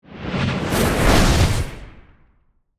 Magic Spell Build, Horror, Magic, Spell, Build, Up; Magic Sound Effects